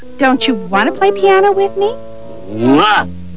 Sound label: piano